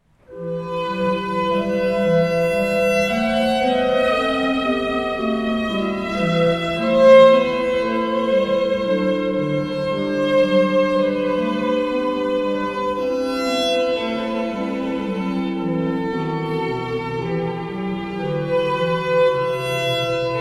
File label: Sarabanda e moll; Corelli